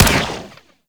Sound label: Arch Burst Gun Shot, Weapons; DE; Warframe; Archwing